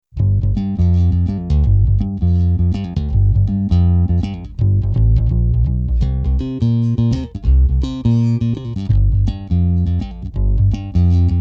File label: amps